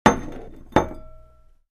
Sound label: Dishes are placed on the table; tableware